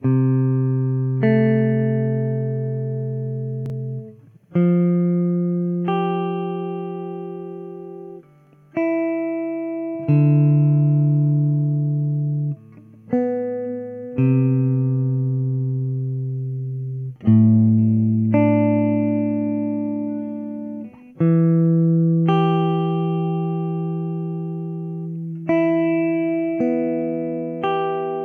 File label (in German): Uebung Tonleiter Hoerbeispiel C Dur Tonleiter 2. Lage, gitarren; Uebung Tonleiter Explosion